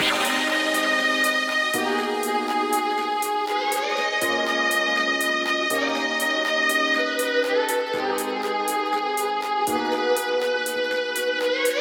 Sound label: Chill City Boss Sax; popslinger; ps4 ps5 switch windows xbox one xbox series xs